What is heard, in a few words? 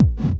Hard Kick